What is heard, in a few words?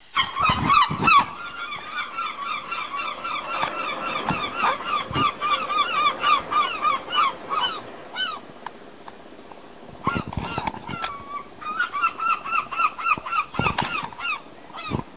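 herring gull shaldon long call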